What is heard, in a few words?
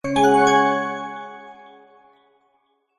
multimedia game sound kids game bright plucked action event tone